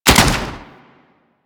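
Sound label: submachine gun Alt 3D Fire; Weapons; Warframe; Tenno; Sundial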